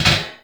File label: Metal Pipe Drop; Ambience; Props; Warframe; Nineteen Ninety Nine